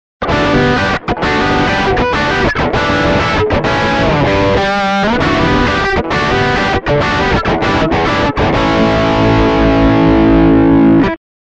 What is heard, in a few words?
bridge, mp90